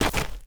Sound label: dirt land, footsteps; chief